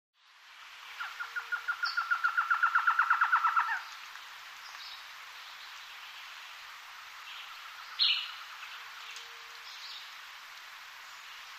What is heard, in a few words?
Jungle Ambience Vari; backgrounds